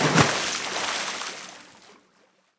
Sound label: Deep Water Splash